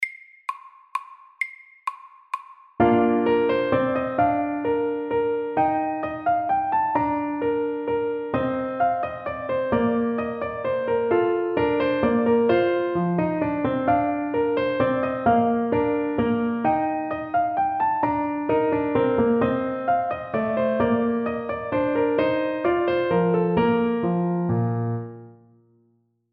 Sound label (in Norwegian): Menuett i G dur FORT